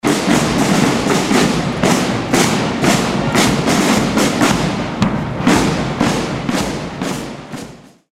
folklore military drums

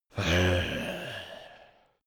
zombie moan idle

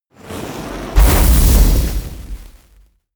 burst

